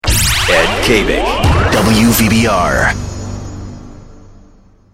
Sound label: kick